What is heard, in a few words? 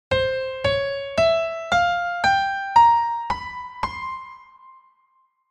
middle eastern c